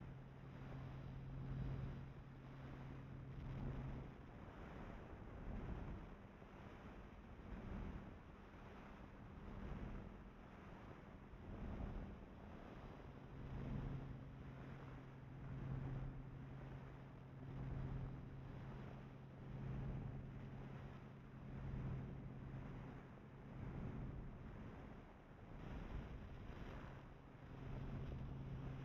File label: ambience vacuum; The Swapper